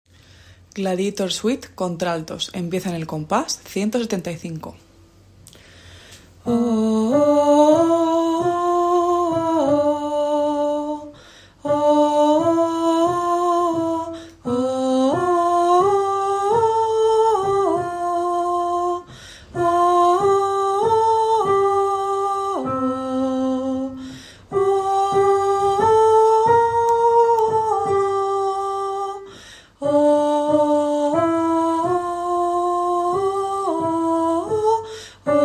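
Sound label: alto; Orchestral Suite; sop,alt,ten,baj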